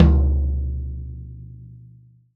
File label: TOM MF; VELO TOM 1